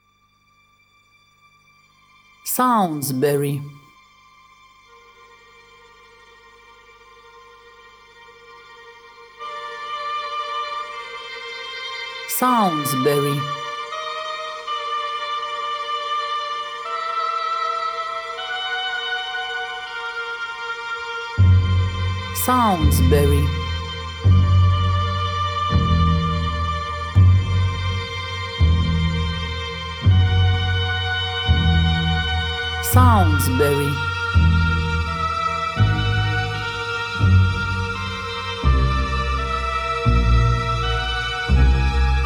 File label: Suspense